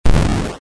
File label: Player launch